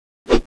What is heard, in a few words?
branch swing